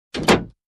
Truck Door Close

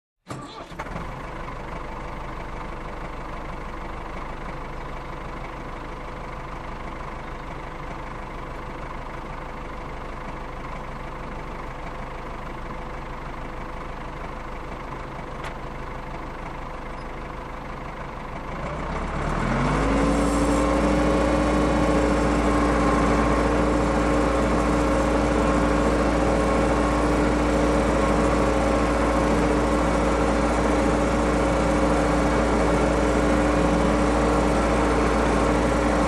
Tractor engine start and turn on mower

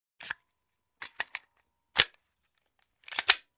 Pistol reloading sound

